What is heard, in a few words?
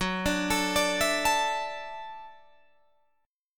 Gb Minor 7th Sharp 5th, Guitar Drop D